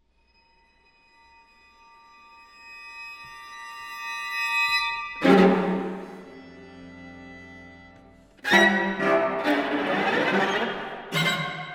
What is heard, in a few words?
String Quartet; György Kurtág - String Quartet, Op. 1 Movement 1